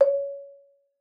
MAR C#5, MARIMBA; Xsample VOL-4

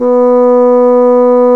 Orchestral Winds Bassoon; Roland LCDP04; 90 s Sample CDs; Bassoons

